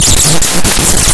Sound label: Laugh Mad